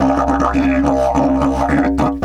108, Didgeridoo; Big Fish Audio - Didgeridoo and Other Primitive Instruments

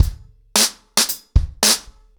110BPM, ACOUSTIC DRUMS; The Roots Drumloops

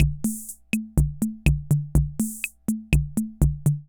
Ace Tone Rhythm Fever Loop

